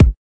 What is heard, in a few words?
kick